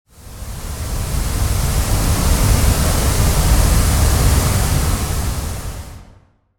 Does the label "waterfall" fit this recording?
Yes